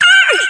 ah

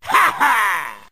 ha ha, ooh ahh; Voice

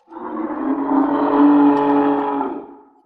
monster; Animals

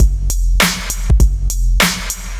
wet, drum loops, boombap

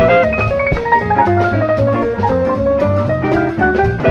piano, Loops; Phonky

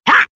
ha

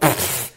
pfft; ooh ahh; human

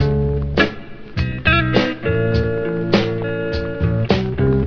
guitar, Phonky loops

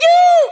"yoo"; Voice; ooh ahh

